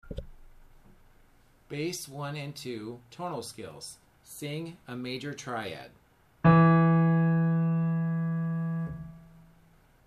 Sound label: Triad Major Bass